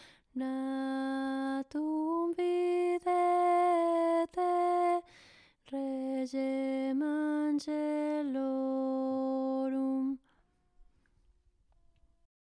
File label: alto test